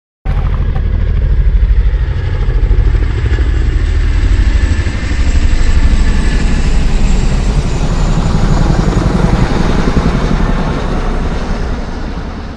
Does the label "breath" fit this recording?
No